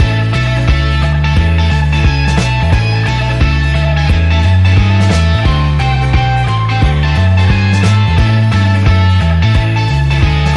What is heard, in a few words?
Swinging Jive 50s Rock and Roll